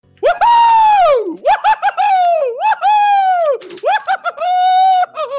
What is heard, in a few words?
happy rat